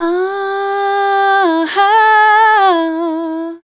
Dance Vocals, FEMALE

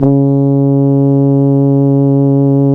C#, Synclav FM, BRASS; Big Fish Audio

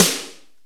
IMPKT S, SNARES; Crypton - DrumBase5000

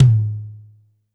Mid Tom L, Standard Kit, Yamaha PSR-E323

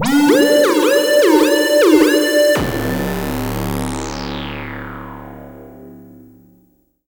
GLIDE, Synth and Organ; InVision Interactive; Keith Emerson; The Most Dangerous Synth and Organ

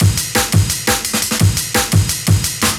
Loops, breaks; M8

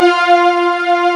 REVE PAD M1 F#4; East West - Global Sounds

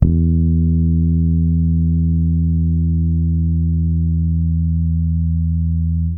harmonic E 1, Pedulla bass; Masterbits CLIMAX 7 - Bass

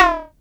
SYNCUSSION

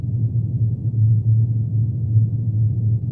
resonance; ATMOS

